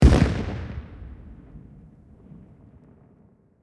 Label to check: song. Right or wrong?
Wrong